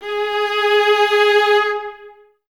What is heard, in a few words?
String Ensembles, Violas; Miroslav Vitous; LINKS ORCH